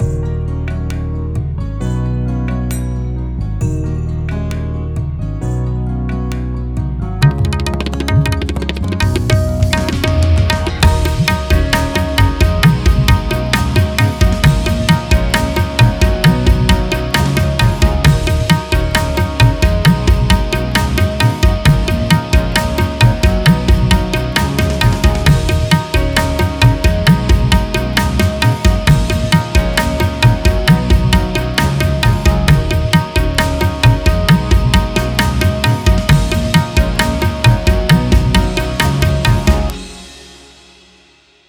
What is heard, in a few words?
tabla